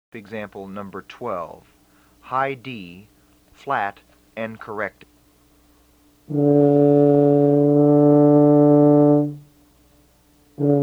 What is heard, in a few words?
Emb TUBA